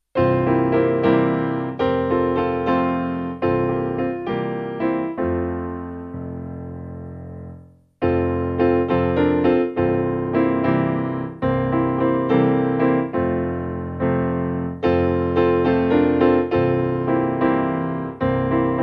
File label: Piano